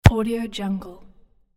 football kicked

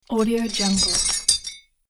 metal chain sounds and stone movement

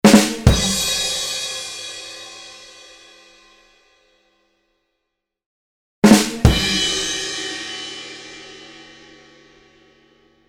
drums accent; ma fx

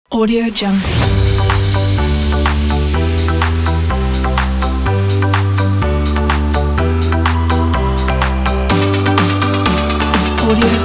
edm dance summer; jinias

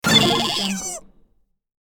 gremlin death shot; sonic-boom

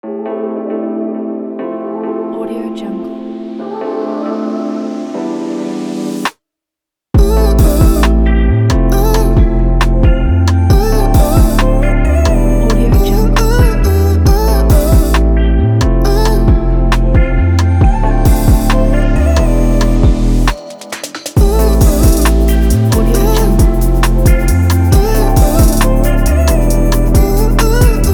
lofi beauty beat

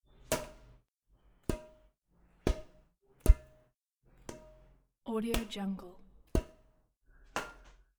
badminton racket shuttle knocks; pluggedinrecords

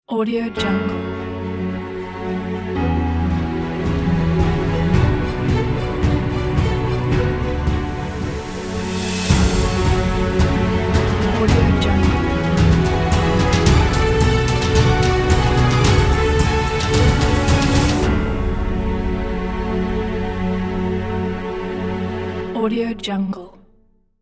epic heart logo

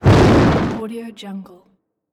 the flame

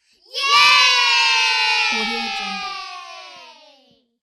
children yelling yay

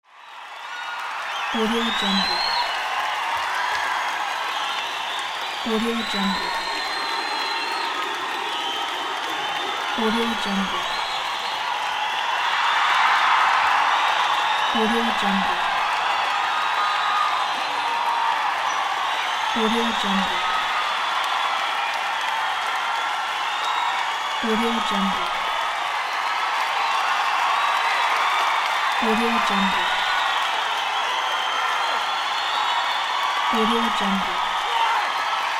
crowd cheers

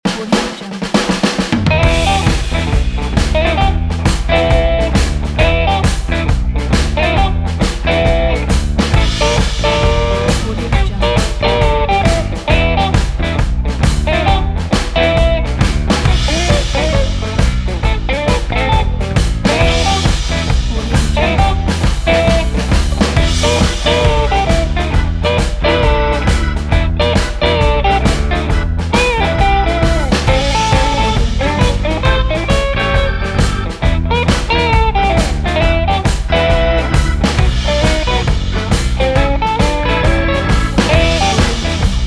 blues

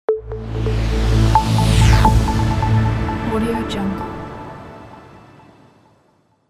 elegant short logo

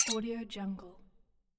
knife scrape

